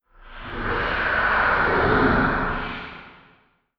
haunt; spectre

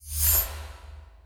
settings slide out; ui; dota

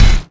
thump, large blade; weapons; dota

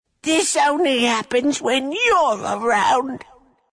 death; dota; ogre magi

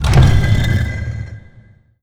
crafting slide; ui

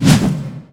whoosh, large blade; weapons; dota

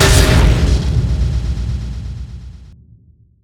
shield bash; weapons; dota; mars

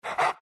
pant small, greevil; courier

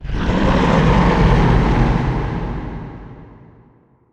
roar; zaug; dota; crownfall

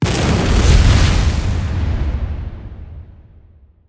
sfx impact; ui; stingers; dota

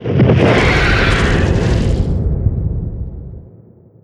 phantasm; weapons; dota; chaos knight

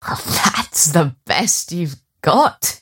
attack pain; dota; mirana

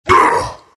morph grunt; terrorblade

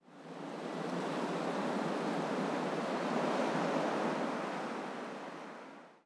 snowy gust; ambient soundscapes; dota

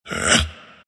grunt; dota; ember spirit

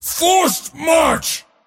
move pain; dota; mars

